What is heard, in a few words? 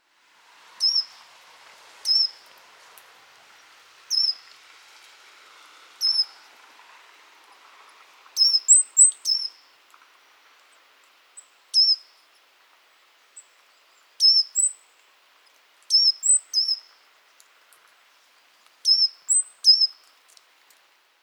Cyanistes caeruleus; SETE